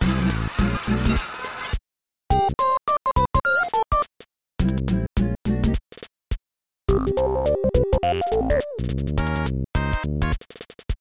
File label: TRENDY LOOP